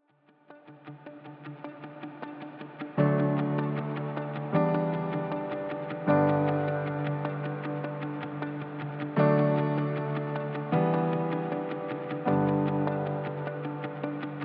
instrumental lofi

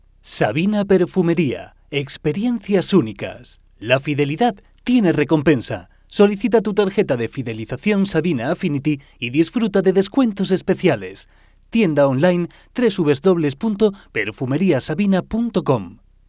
jingle

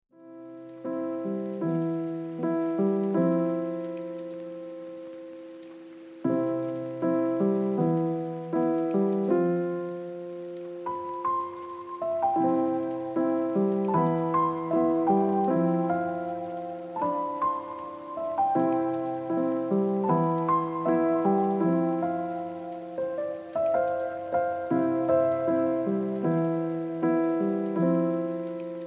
instrumental lofi

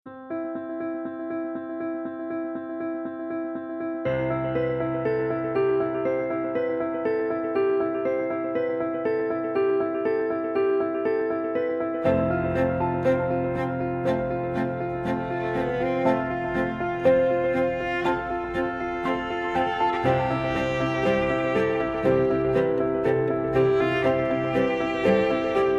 Piano Instrumentals; Recepcion y Pasillos Hotusa

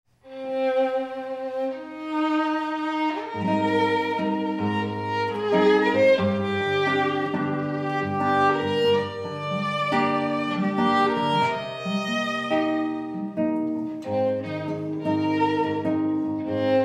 Violin & Guitar Duo

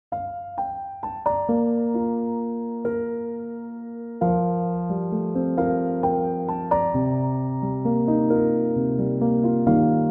chill piano instrumental

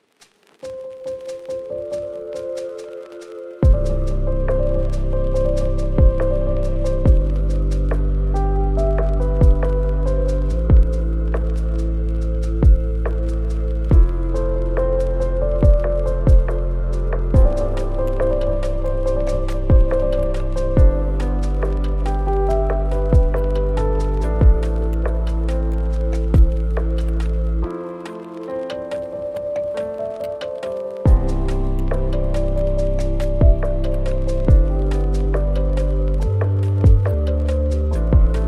instrumental lofi

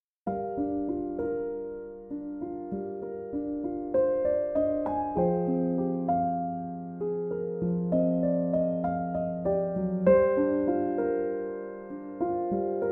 piano instrumental

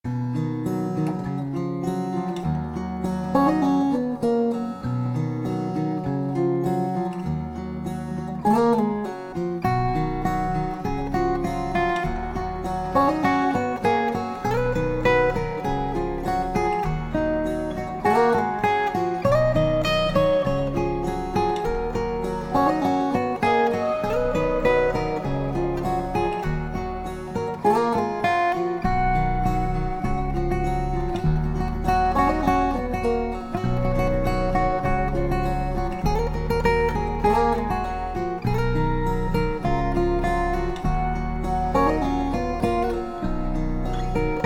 acoustic instrumental

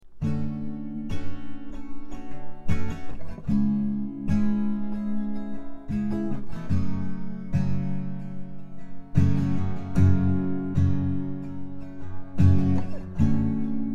chill guitar instrumental

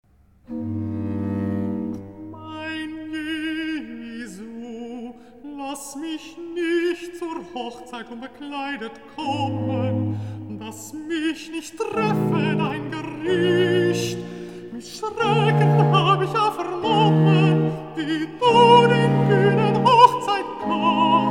Recitativo (Alto); BWV 162; Mein Jesu, lass mich nicht

